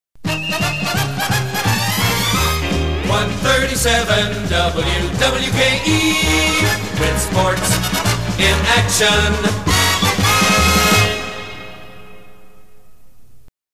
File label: jingle Sports in Action; WWKE